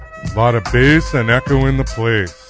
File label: bass place